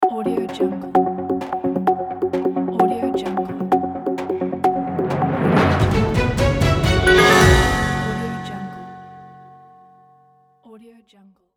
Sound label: breaking news logo; musicalsmile